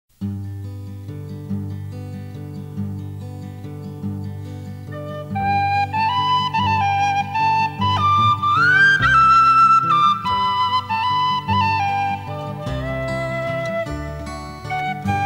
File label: Waltz